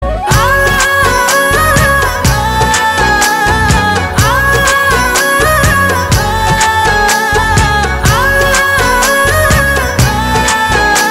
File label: Ringtone instruments